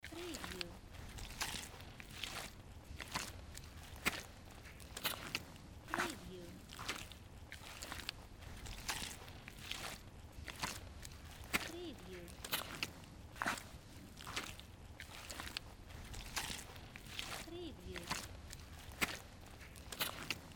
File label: Walking Through Mud